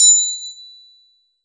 crotale; Cymbals

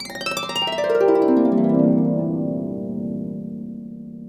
gliss down, harp; InVision Interactive; Lightware VOL-1; Instruments & Percussions

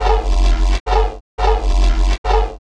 Bass loops; neuro; Optiv & BTK

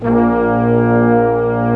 BRASS A2; AKAI S6000 CD-ROM - Ultimate

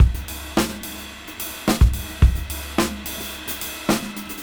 Junky Funk 107 BPM

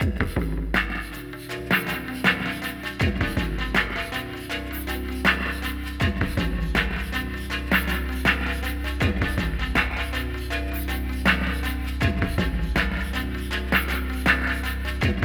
FX LOOPS; Zero G; Malice In Wonderland

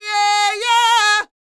D YEAH, Vocal R&B Dance; DB DANCE

